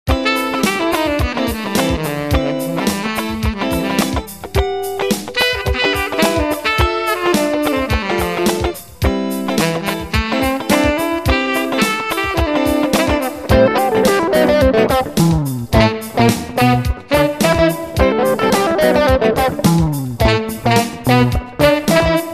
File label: Lugdunum Funk